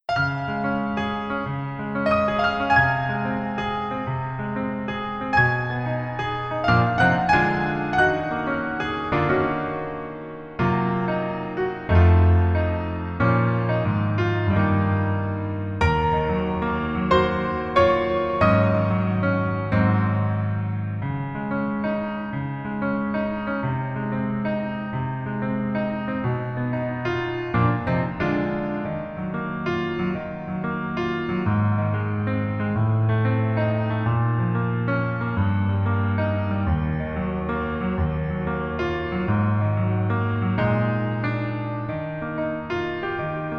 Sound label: Piano ver